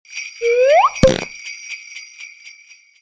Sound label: Snow Guard Spell